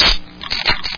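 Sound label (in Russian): скрипучее открытие банки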